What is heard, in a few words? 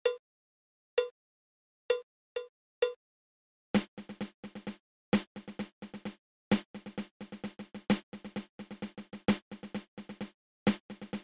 Double Ratamacue